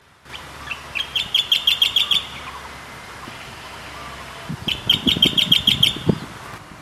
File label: Striated Grassbird calls, Marina Miri Sarawak Malaysia